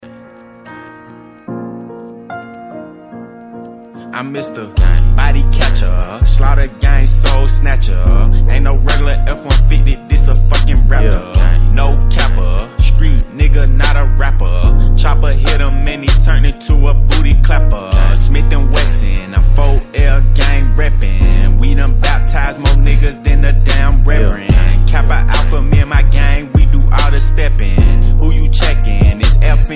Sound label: korean ringtone